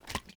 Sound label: bota bag slosh fast; Rust